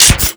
Microwave Pistol Mech

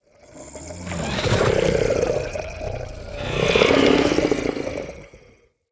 Idle, Vocals; Characters; Enemy; Corrupted Sproutfolk

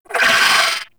Vocal Pain; Warframe; Moa Satyr

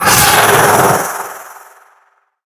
Vocal Attack; Warframe; The New War; Archon; Nira

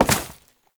Step Vegetation, Foley; Warframe; Oraxia

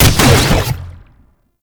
Archgun Shot; Warframe; Weapons; Archwing; Tn Nokko Archgun

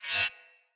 Saw Dagger Whoosh Accent Heavy; Weapons; Player; Swords

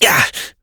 Pain Short, Adult; New War; Adult Operator

